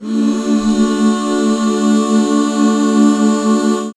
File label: D 6, back chords; Sound & Vision - Gigapack II CD 1